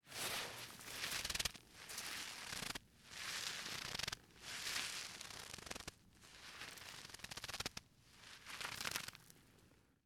Sfx Snow Crush

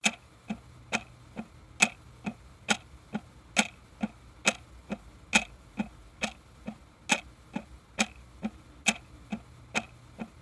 Tick Of The Clock

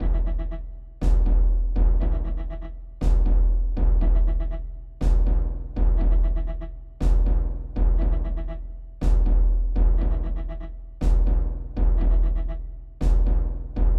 Fiddle And Timpani Loop

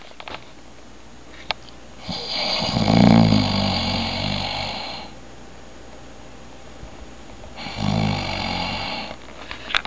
Snore

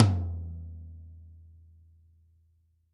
Tom

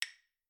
Clave low

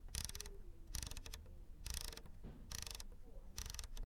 Radio Volume Dial BMW 118i

